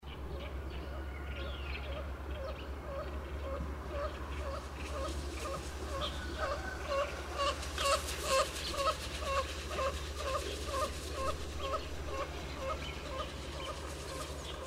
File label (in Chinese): 黃頭鷺飛鳴; 牛背鷺; 鷺科/留鳥．夏候鳥．冬候鳥